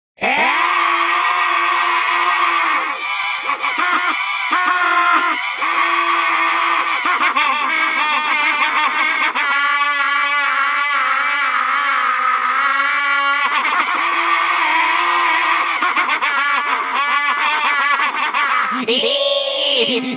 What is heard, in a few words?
waaha; cave